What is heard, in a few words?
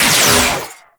Trapper Trip Laser Impact; Warframe